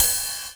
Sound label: GROOVE RIDE, Drums Dance & Groove; USB Soundscan vol.11; AKAI; GROOV KIT